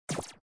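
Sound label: shoot Bullet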